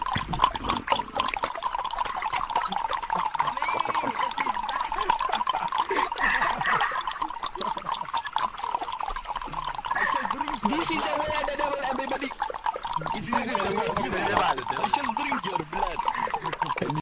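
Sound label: uganda warrior anthem ringtone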